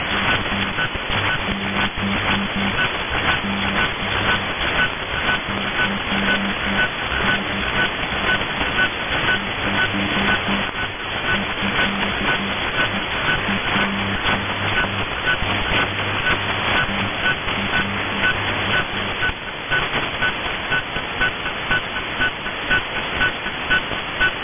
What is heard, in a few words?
Periodic Beeping 1 kHz